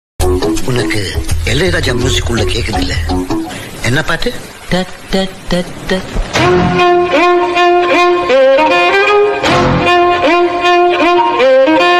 90s love bgm tamil love bgm

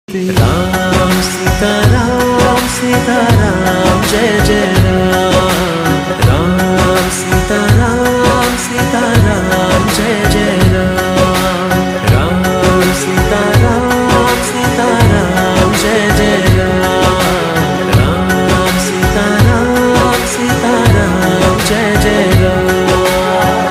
ringtone kannada

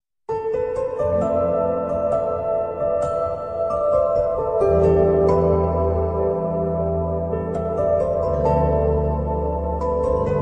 ringtone instrumental; kaffara; drama ringtone

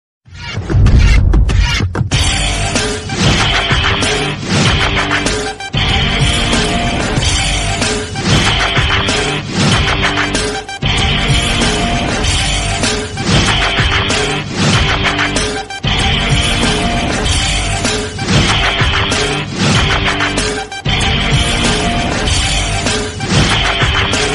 telugu movie bgm ringtone; munna; prabhas

